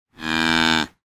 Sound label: animal bull scottish highland moo